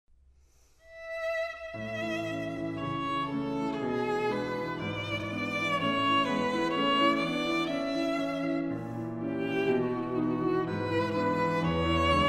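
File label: violin sonata; franck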